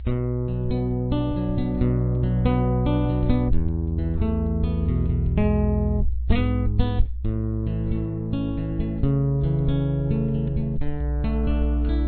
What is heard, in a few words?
chorus, guitar